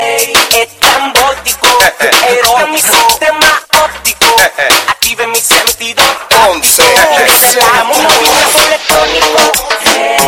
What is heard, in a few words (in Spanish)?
eloy movimiento electronico